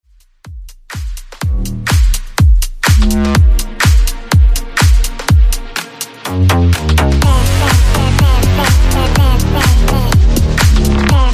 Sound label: 124 BPM